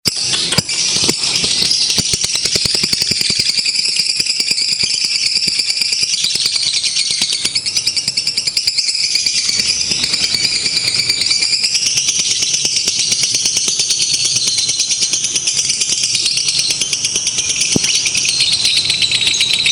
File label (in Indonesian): simulasi lomba lovebird